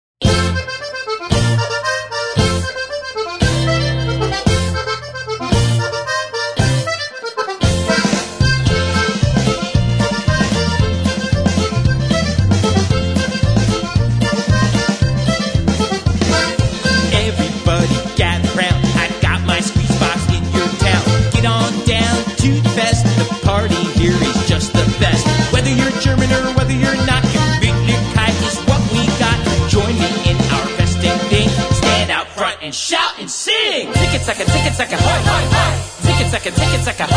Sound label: air accordion